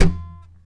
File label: TAP, FLAMENCO Guitars